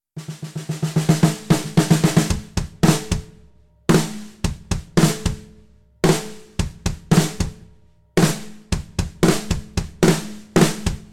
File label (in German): Supralite Tief mit Geldpad